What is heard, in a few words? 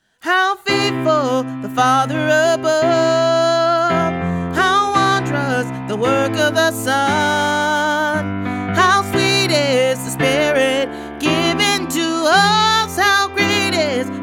Alto w Lead, christmas choir